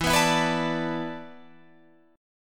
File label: E 7th Suspended 4th, Guitar, Drop D